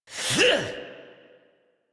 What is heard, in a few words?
Exert, Adult; New War; Melee Swing; Operator